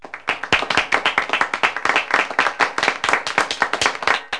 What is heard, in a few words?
Claps